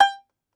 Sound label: Tenor Banjo, Plucked Strings